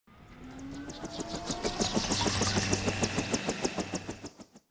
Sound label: propeller out